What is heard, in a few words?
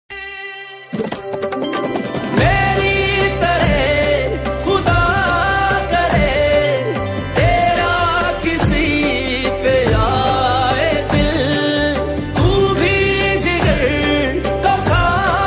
pakistani ringtone